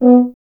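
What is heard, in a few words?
brass French horn; Miroslav Vitous; Solo Instruments VOL-2; Solo lnst. II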